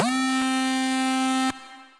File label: Bendy, Synth Lead